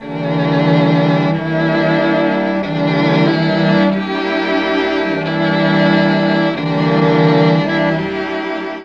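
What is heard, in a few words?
E4 bass strings, Mellotron; Mike Pinder - Mellotron Archive CD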